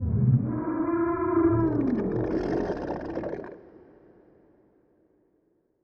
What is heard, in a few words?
voidspawn death; Stellaris; reanimated